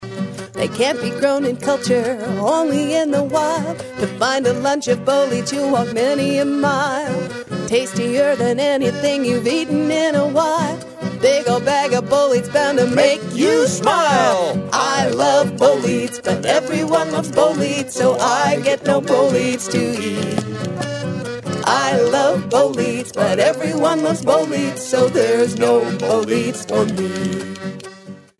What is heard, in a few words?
Polka; Bolete